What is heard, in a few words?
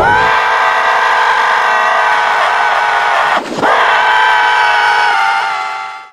squid kill scream; catnap n grabpack